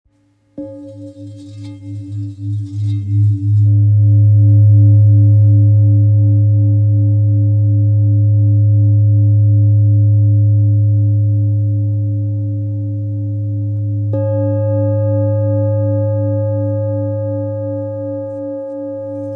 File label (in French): Bol ramgandi re